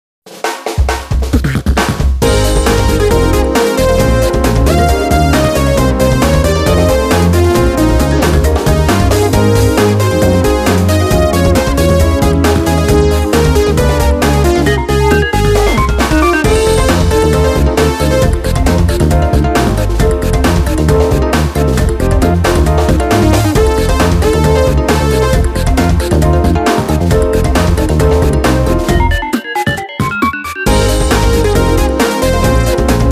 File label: Music Menu event